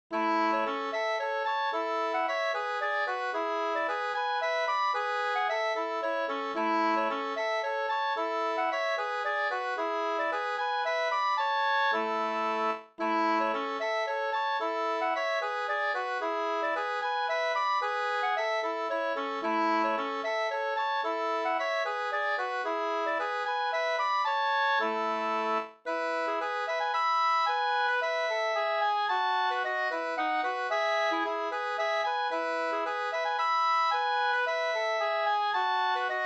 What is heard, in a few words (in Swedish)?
slängpolska